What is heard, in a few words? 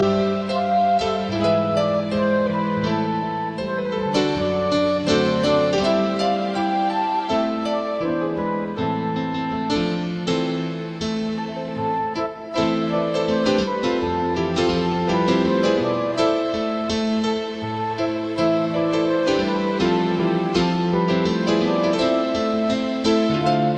Instrumental